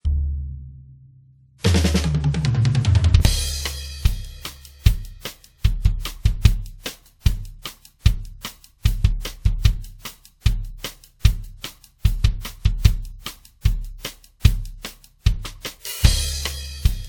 Country Rock Solo

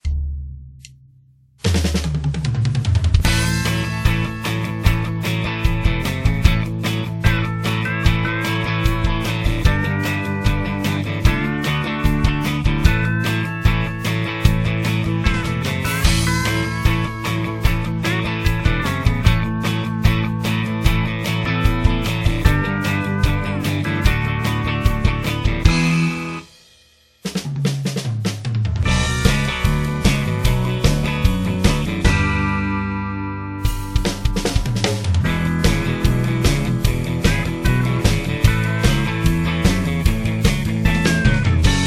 Country Rock Band

